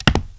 fall; Alien Arena; hunter

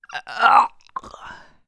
drown; Alien Arena; cybergirl

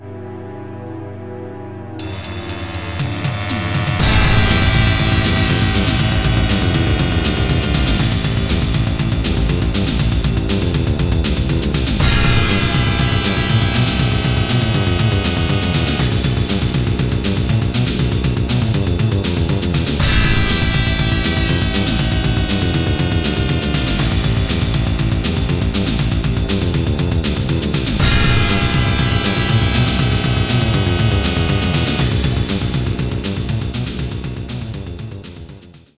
battle, music; Alien Arena

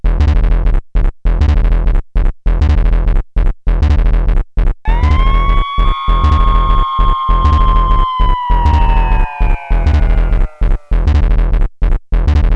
mars music; Alien Arena

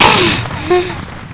fall; Alien Arena; drfreak

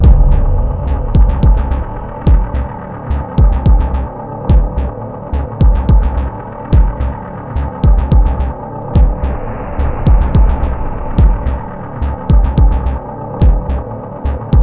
mars music; Alien Arena

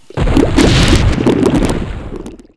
drown; Alien Arena; droideka

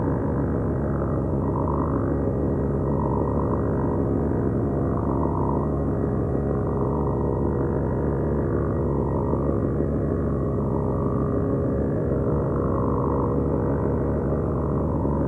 forcefield; Alien Arena

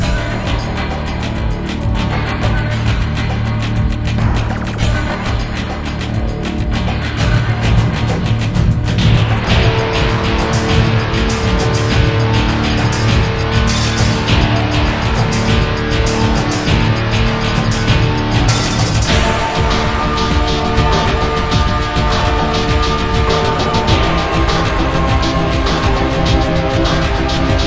electro, music; Alien Arena

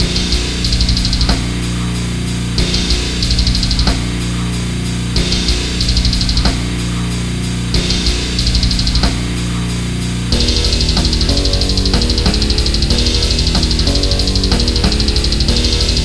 meat grinder, music; Alien Arena

